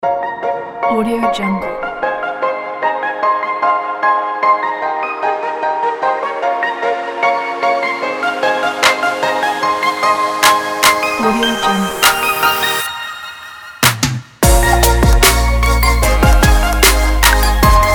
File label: future bass kit